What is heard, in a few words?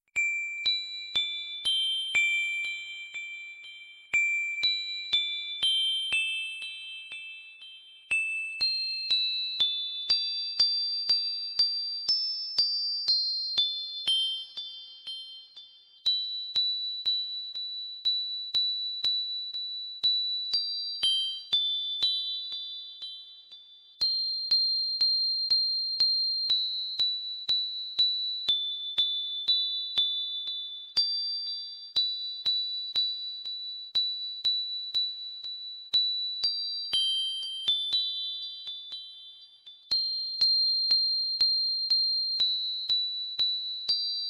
jingle bells